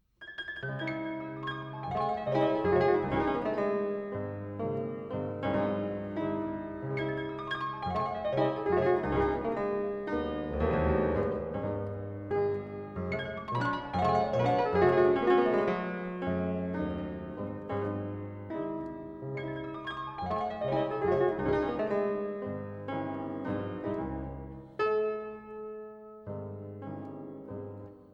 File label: Preludes Op 28 No 10 in c sharp minor, Piano; Chopin Preludes; Frederic Chopin